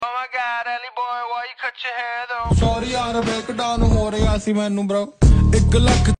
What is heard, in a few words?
Ringtone